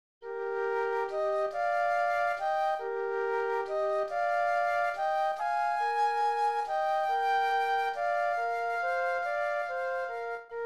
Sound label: Waltz Flute Duet; classical; Lehar; Merry Widow